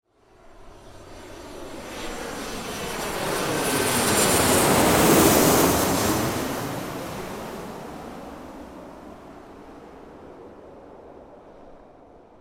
am thanh tieng may bay o san bay

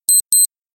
tieng chuong thong bao dong ho casio keu 1 lan

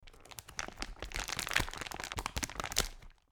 tieng mo tui ni long

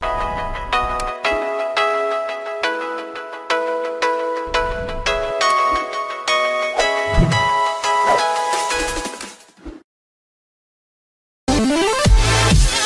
nhạc intro

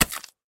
am thanh giam phai phan bo

